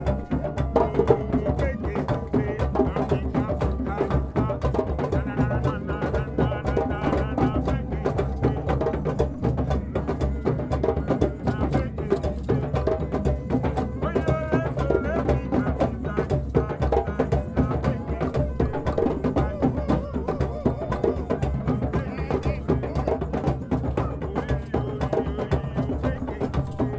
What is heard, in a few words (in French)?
tamtam avec voix